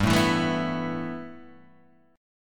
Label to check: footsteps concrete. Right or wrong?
Wrong